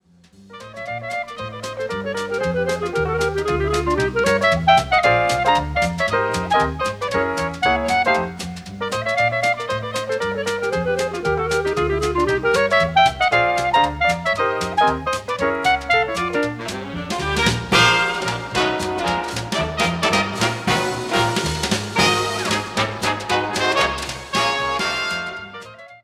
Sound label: Funny Clarinet